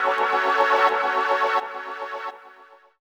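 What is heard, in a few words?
Chord A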